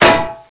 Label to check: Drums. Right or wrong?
Wrong